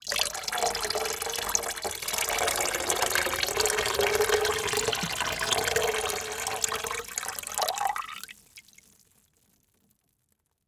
Liquid Water Pouring Into Water In Plastic Bucket Steady Long Fast